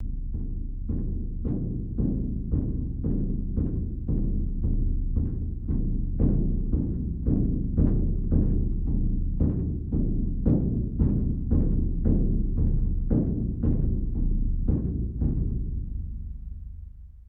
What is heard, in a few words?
Percussion Bongos Tympani rolls Gongs Drums